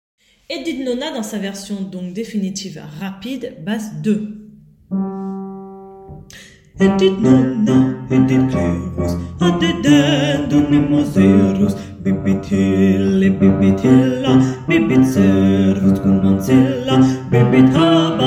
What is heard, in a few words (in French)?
Basse 2